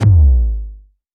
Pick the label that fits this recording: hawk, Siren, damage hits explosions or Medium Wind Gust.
damage hits explosions